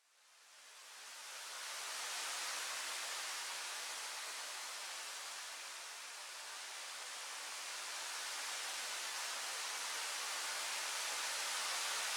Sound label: musicbox